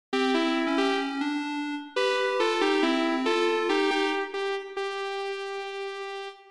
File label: Victory Theme; fanmade super smash bros ultimate vol. 41; Google Creepypasta Electric Railway